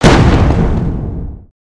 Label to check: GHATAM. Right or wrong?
Wrong